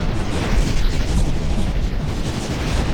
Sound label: Engine Fire; timez attack